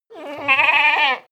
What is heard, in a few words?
goat baa mild